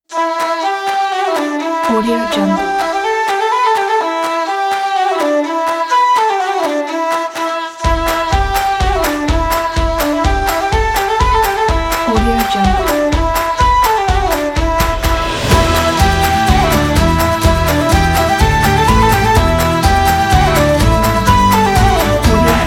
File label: irish music